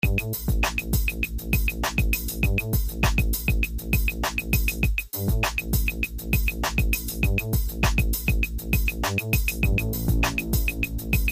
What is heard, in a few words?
scratching